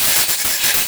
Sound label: All Coolant